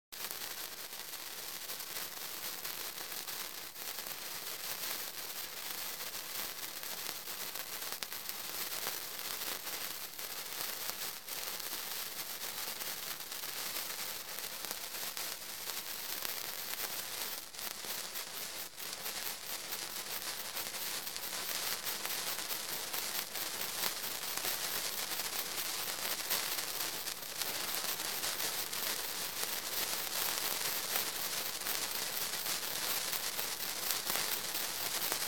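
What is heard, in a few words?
Sparkler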